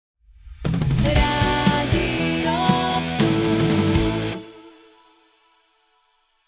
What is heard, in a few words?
Stinger Rock